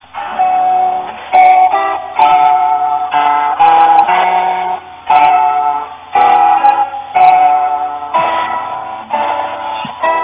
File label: lute, guitar